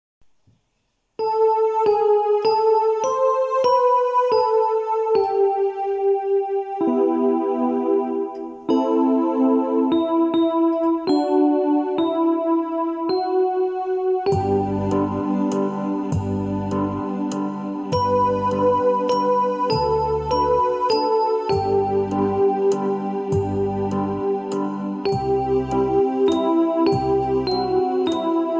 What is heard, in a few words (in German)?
Glockenspiel